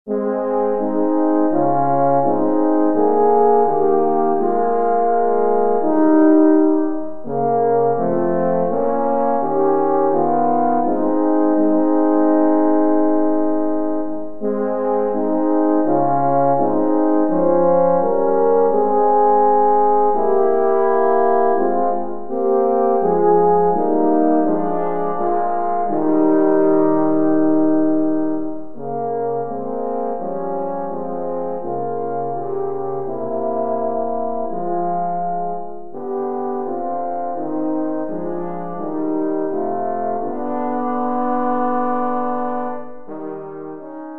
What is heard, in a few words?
horn trio